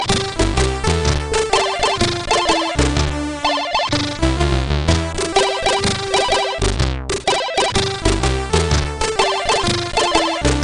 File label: Tone; Giana Sisters